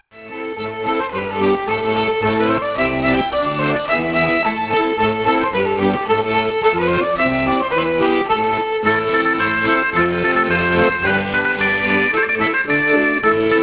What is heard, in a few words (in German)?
Schottisch